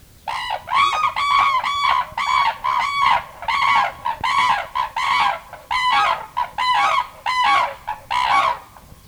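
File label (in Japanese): tanchou, タンチョウ 鳴き声; 早春、オスとメスが鳴きあいながらダンスのようなディスプレイをくりかえし、つがいになって湿原になわばりをつくります。; つがいの関係ができあがると、このデュエットは威嚇として使われます。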